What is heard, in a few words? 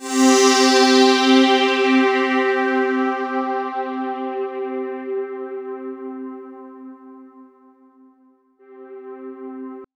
C3, Roland JV 2080, POLY SAW